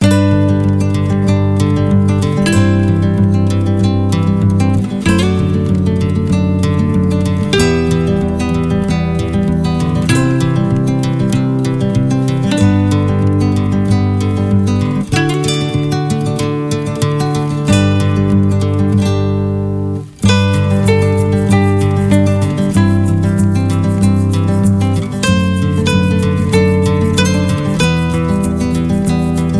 Acoust Folk I, LOOP; MIUI14 and HyperOS 1.0

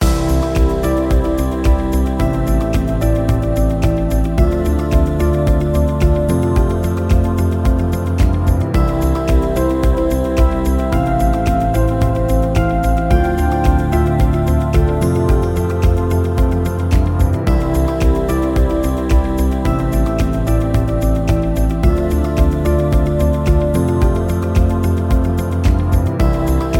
Inspiring loop, ringtone; MIUI12

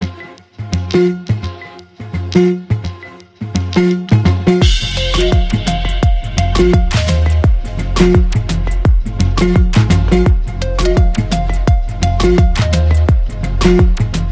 Pulse; MIUI12

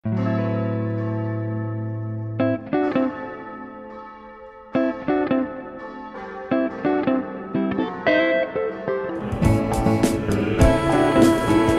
Funky Bells; MIUI12

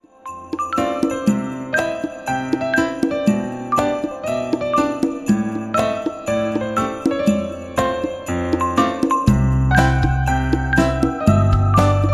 Beautiful Rhythm Pop Music, ringtone; MIUI14 and HyperOS 1.

